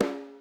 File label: snare, Millenium MPS-400